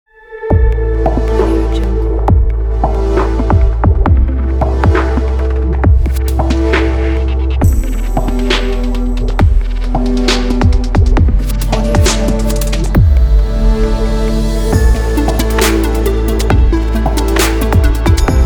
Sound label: digital future technology ident